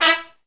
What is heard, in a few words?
BRS TP, Roland, Stabs; Roland L-CDX-03